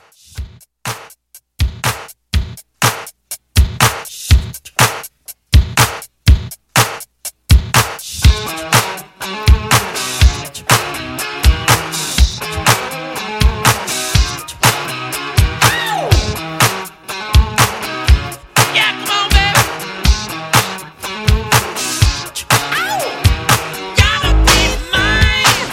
80s Pop Extended Re Drum 122

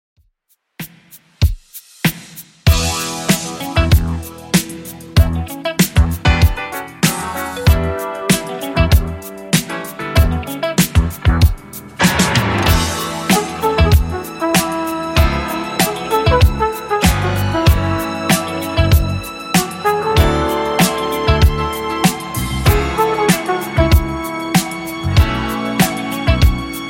80s Redrum 94 bpm

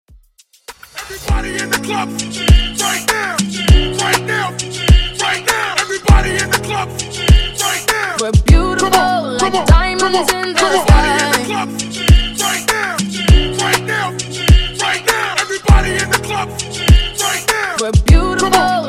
Hype 100Bpm

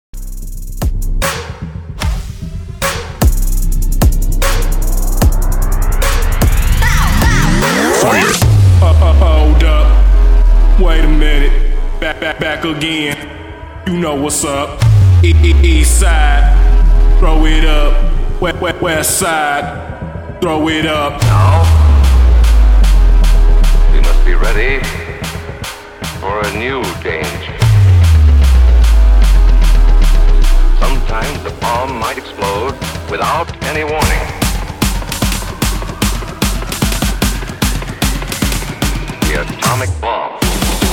Hype Trap 150bpm

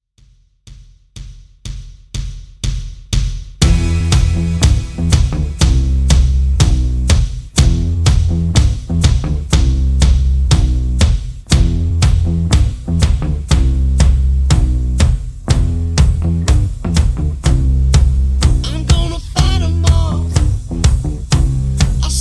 Dj Intro Outro, 122Bpm

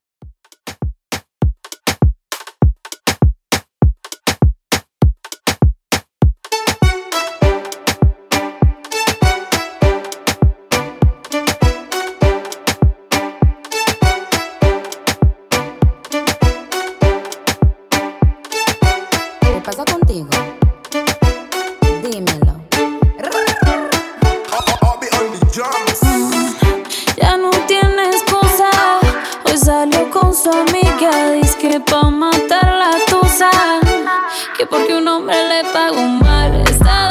In Outro Reton 100Bpm Dirty

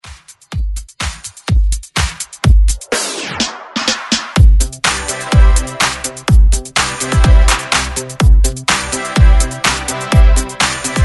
80s redrums

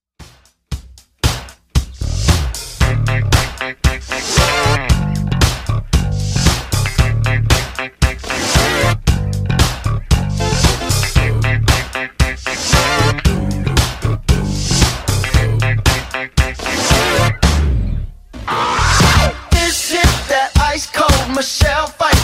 Top40 Extend 115bpm

